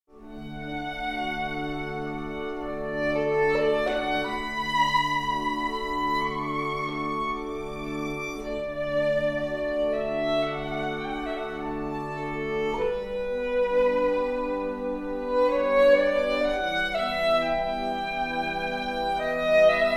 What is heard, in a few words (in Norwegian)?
meditation fra thaiis